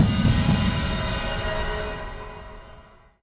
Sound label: Hi Hit